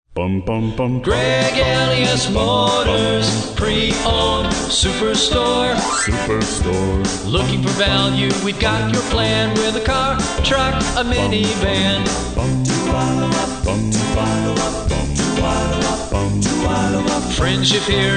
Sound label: Jingle; GREG E MOTORS